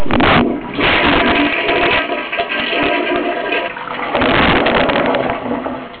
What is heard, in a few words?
FLUSH, COMMODE; swbreakthrough